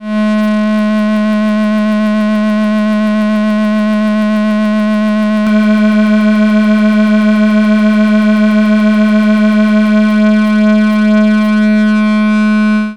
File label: scanner delayline